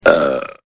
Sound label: burp